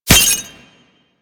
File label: Helmet Impact; Warframe